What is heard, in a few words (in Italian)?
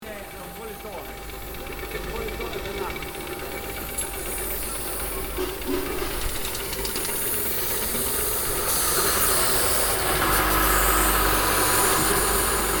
Il Bollitore